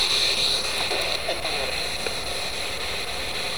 bruit tir voix

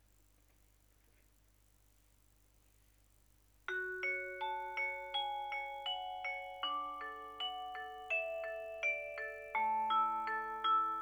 enregistrement piano

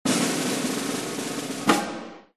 roulement tambour

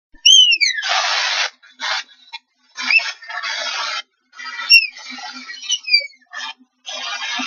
cri

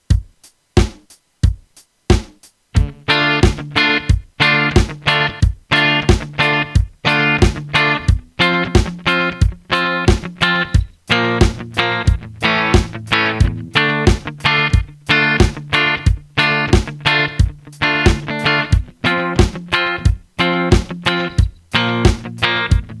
riff guitare

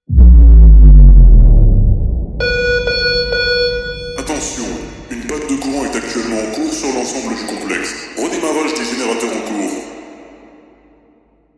coupure courant

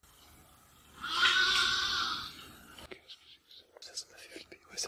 chevre amplifier

